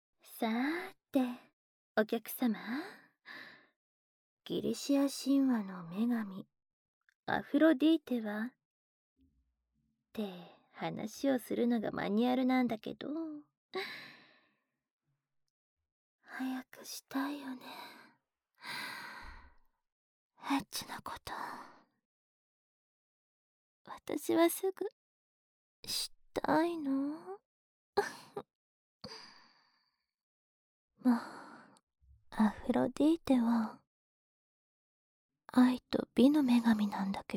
耳舐め＆手コキ

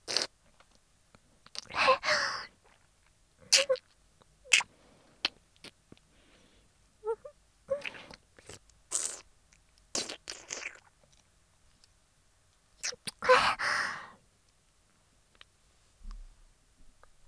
フェラ増しスパイス！

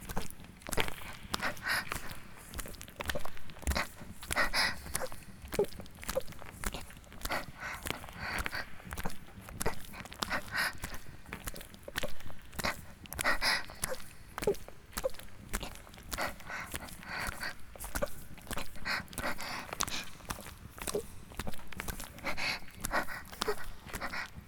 雨降りバージョン ギシアンループ; 田舎の別の無感情少女と自己犠牲愛2; おまけ (第3、4話のギシアンループバージョン)

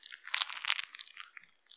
挿入or引き抜かれ終わる直前 膣内射精後or興奮時; 挿入or引き抜く

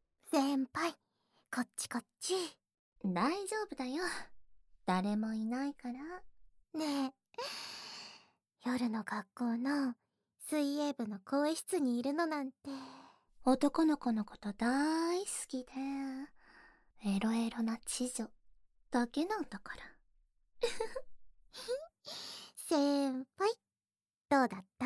耳舐め, ASMR; 鹿子嶋姉妹によるロッカーＷ耳舐めオナ手コキ！; 効果音なし

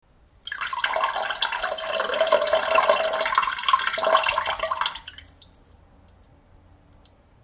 ちょぼぼおしっこ

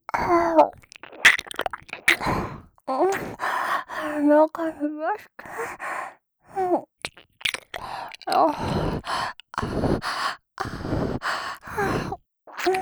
中に出して 耳舐め喘ぎ; シャルロッテ

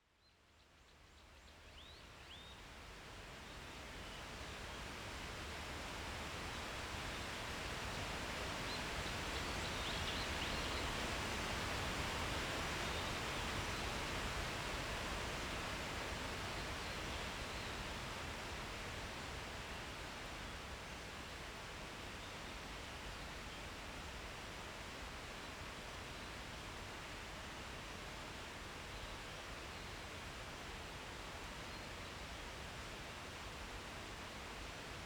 お不動大滝（お不動大滝環境音）

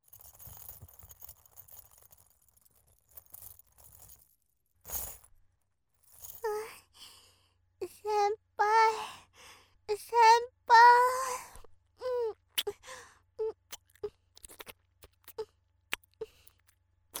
フェラ（SE）

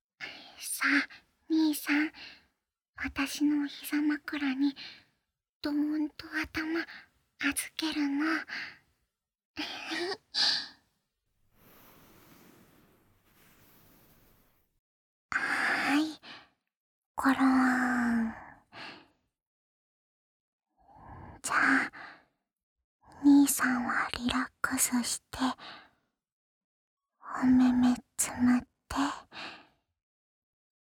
耳かき; にーさんに、たーっぷり耳かきしちゃうね

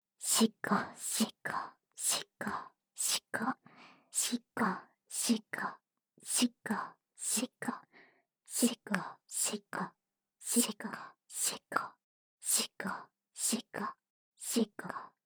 シコシコループ; 双子; ダウナー系仲居のゆまちゃんに温泉旅行中に色エロされちゃうバイノーラルwith わかな＆わかば

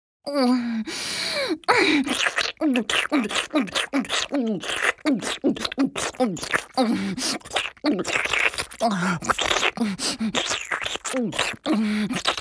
フェラチオ(強)：頭を前後に激しく振って陰茎を深くしゃぶる, ASMR; 実用ボイス抜粋集

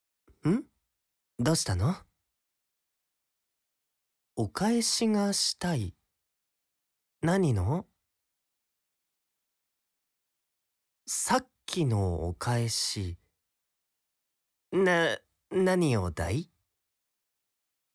私と耳かきしませんか？; かわいい年上彼氏編

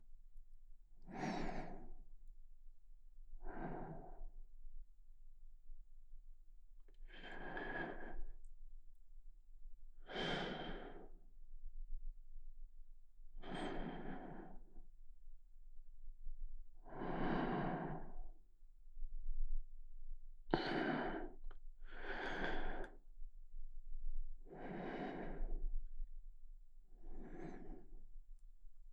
寝息トラック; 一緒におやすみ; vol.4 アナグマ

